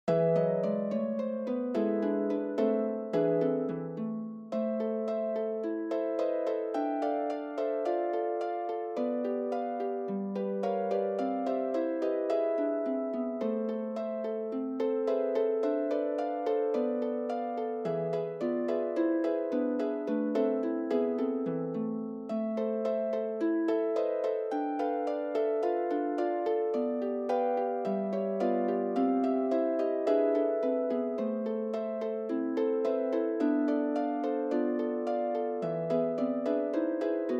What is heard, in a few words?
trio, guitar